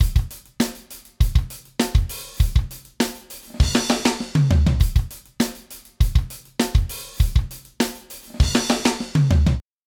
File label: summit audio grand channel drums; softube